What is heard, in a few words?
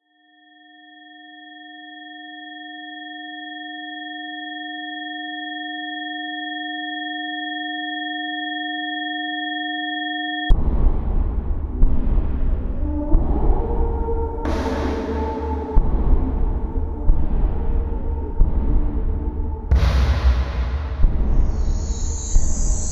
tinnitus